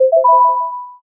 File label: success